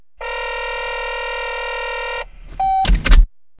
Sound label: phone